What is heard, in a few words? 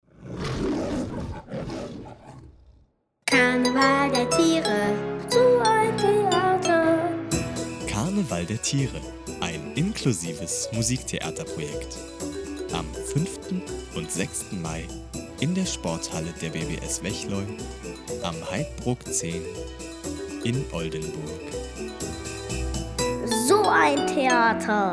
Jingle